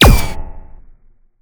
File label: laser; weapons; rsi